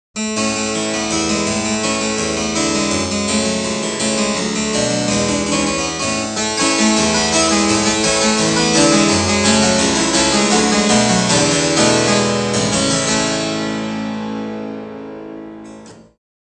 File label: harpsichord